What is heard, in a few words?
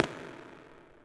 marble; ambient; Shadowman